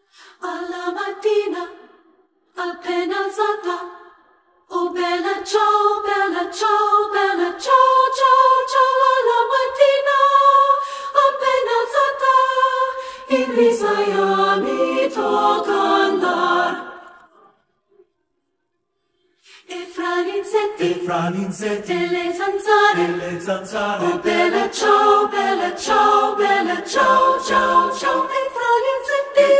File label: bella ciao 3-part mixed; arr. ruth morris gray